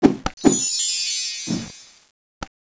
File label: magic mg idle; weapons